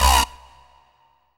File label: RAVE, RAVESYN